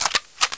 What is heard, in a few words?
scout bolt, Weapons